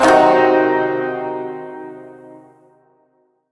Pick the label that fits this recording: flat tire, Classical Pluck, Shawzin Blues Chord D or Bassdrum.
Shawzin Blues Chord D